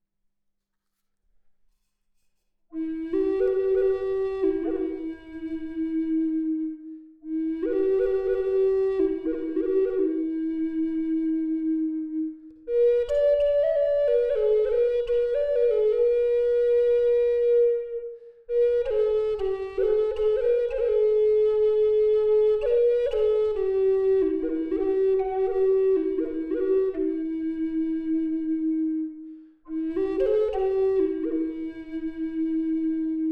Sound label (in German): Ast E 432